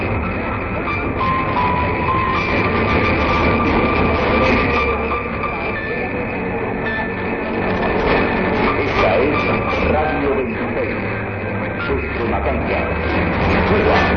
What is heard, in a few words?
piano